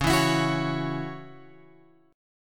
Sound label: C sharp Minor Double Flat 5th C sharp, chord; C#mbb5 Chord {9 7 X 9 7 9} strummed